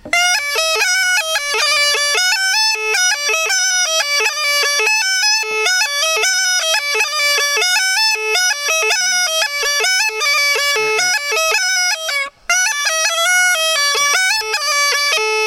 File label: Chetvorno Line, Small Gajda